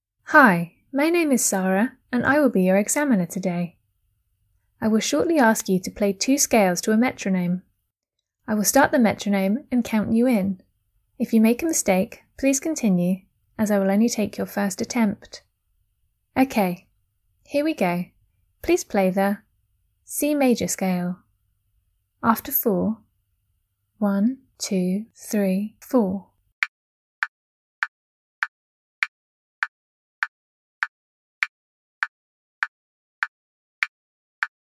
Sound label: 1 octave c major scale piano